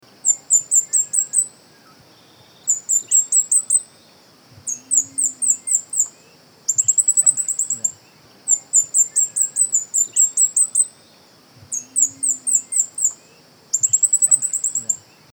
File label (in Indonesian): suara cabai gunung